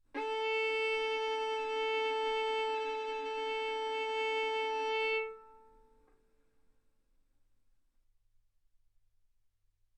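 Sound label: Violin arco mf A string A4 B4 stereo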